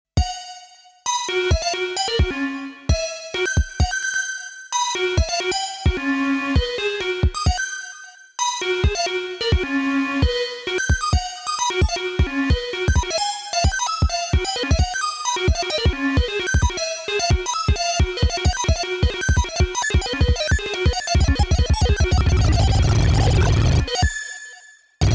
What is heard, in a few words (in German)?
Melodie External Clock Euclidian Tempo Change; Five12